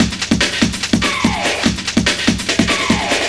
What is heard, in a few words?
LOOP, Eurotech; Sounds Good